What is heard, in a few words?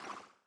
circuit upgrade